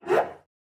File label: Cello Psycho Screech; SoundsCrate